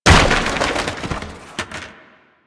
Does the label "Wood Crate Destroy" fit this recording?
Yes